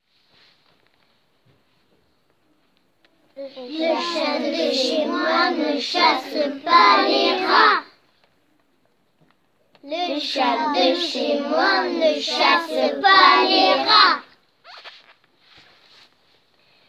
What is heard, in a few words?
Vocal